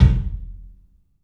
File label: KIK, LIVE CLUB KK, Drums; 3D Sound